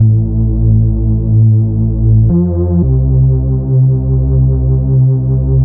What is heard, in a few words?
Liqueed Bass